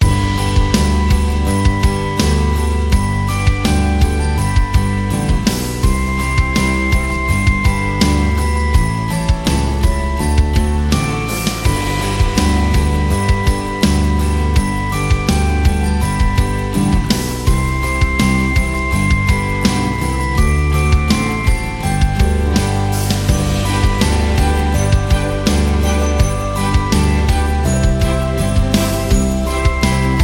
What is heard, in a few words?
Jingle Bells; MIUI14; HyperOS 1.0